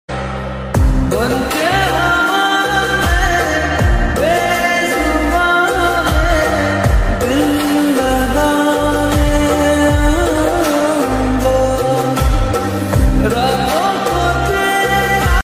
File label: slowed reverb ringtone lofi version